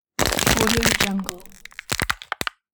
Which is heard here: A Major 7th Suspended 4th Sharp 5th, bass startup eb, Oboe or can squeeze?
can squeeze